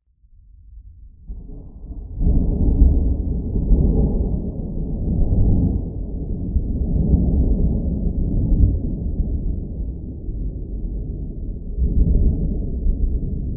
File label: Thunder moderately distant rolling; boom library; Thunder And Rain